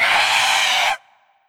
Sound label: Banshee